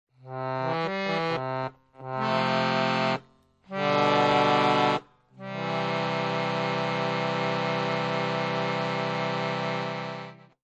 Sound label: Accordion Finale Ton; keyboards